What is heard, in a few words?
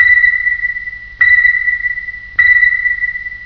sonar ping